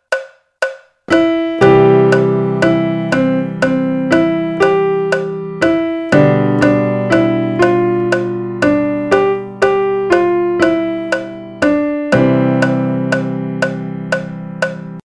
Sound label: Mucama Bonita 120 BPM